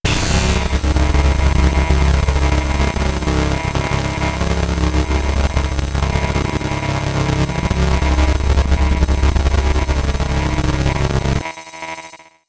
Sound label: Magic Ambience Electricity; David Dumais Audio; Electricity Magic 1